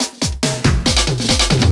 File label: Fills, Loops 140 BPM